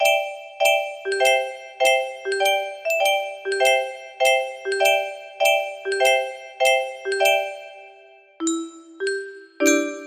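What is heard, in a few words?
music box melody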